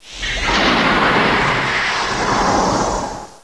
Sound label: bird prey